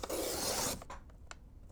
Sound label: Cut Paper, paper cutter; Micro Pack